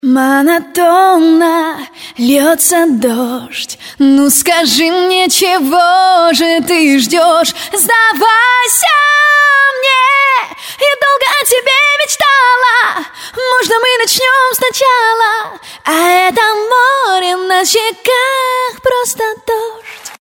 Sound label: acapella